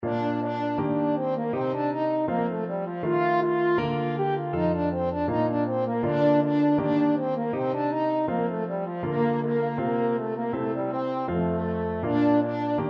french horn

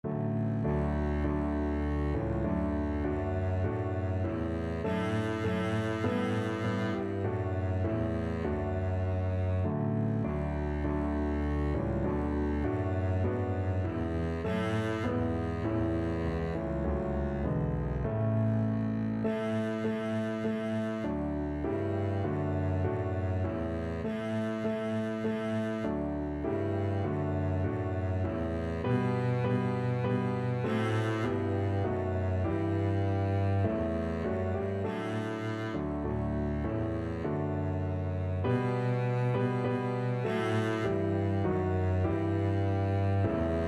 hark, double bass

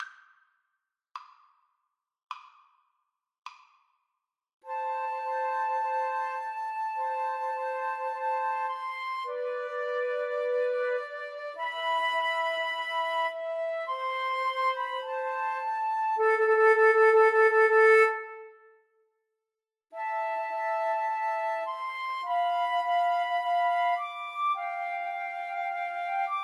Flute Trio

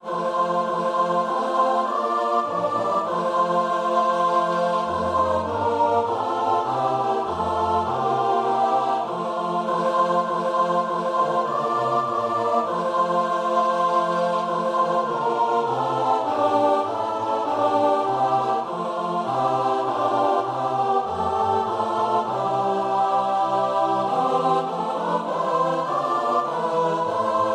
choir

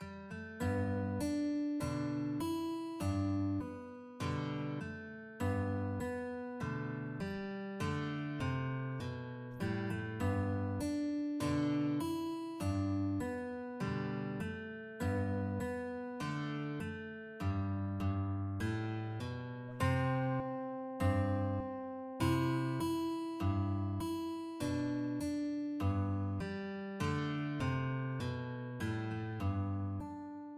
GUITs

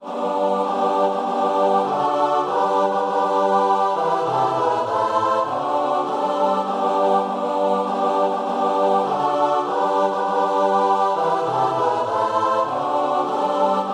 CHOIR

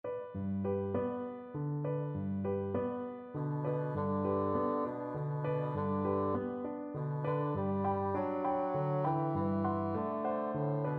brahms lullaby, bassoon

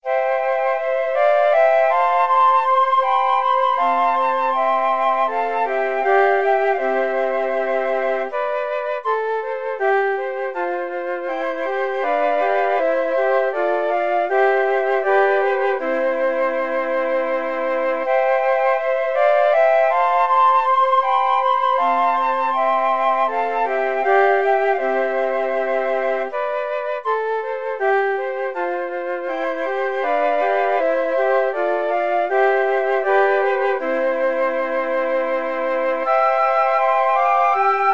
flute quartet

